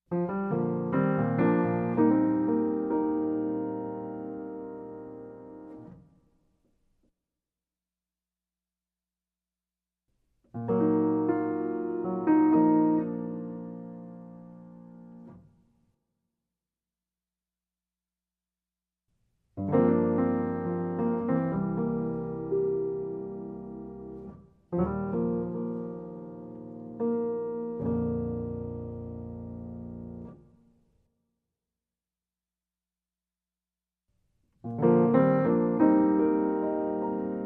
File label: Underscore